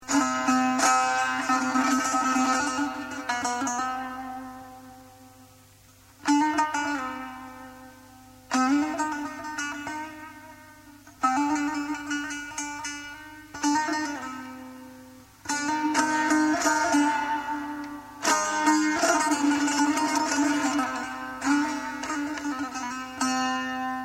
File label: tanbur; Tanbour